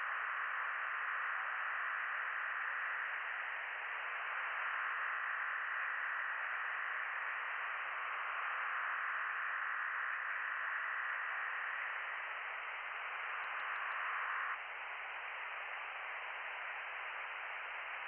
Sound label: from radio, 7177KHz